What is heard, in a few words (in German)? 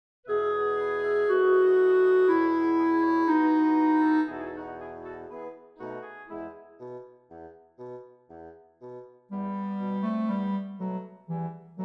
Klarinette